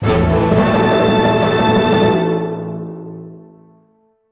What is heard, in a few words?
music stings stinger; LEGO Movie Videogame; PlayStation 3; pirateship